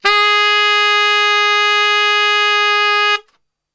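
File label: G# 2X SAX STR, saxophone; Best Service; Brass Super Section; SAX STRGHT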